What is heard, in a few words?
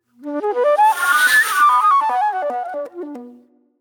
Pop Flute